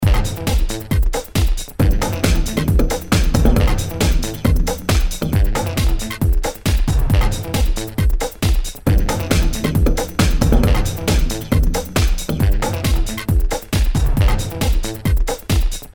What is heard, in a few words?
Block Smash music